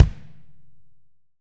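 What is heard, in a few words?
AMB KI, ambient kick, studio; AKAI S6000 CD-ROM - Volume 3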